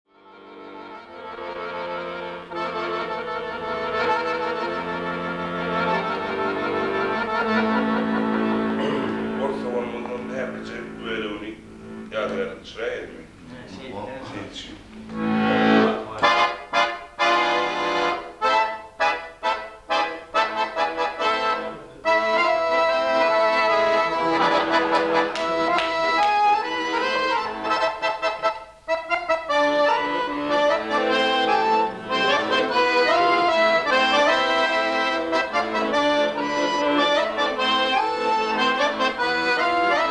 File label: garmonda saz